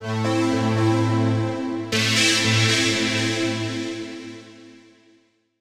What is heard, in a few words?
SYNTHPAD, 125; Sphere